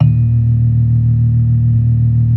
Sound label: B3 D#2; B3 LES